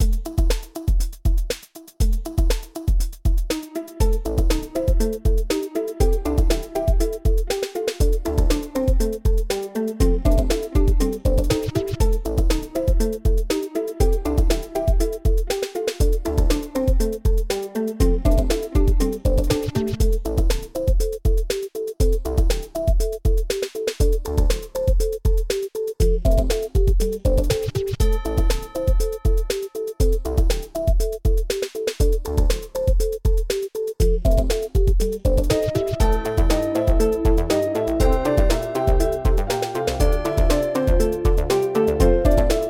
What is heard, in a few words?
Main Music; Plants vs. Zombies